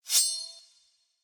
Death Sword Fall; Smite; Susano

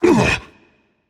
breath rage; chaac

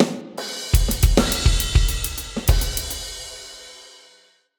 defeat drums; smite; raijin

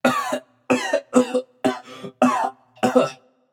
clear throat; smite; xbalanque

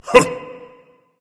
grunt jump berserk; cuchulainn

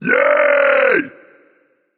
nv yay; Smite; ymir skin14

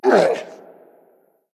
hitreact human male large

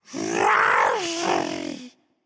growl; smite; xingtian

